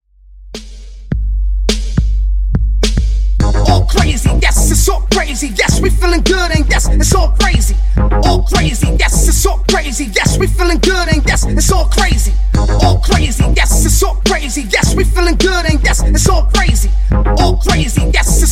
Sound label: Funk Break, 105Bpm